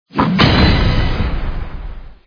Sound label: UI Explosion; football; Brazil